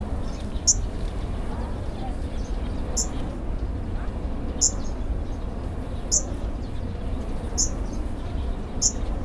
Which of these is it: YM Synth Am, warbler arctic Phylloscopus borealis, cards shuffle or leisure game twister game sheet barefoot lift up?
warbler arctic Phylloscopus borealis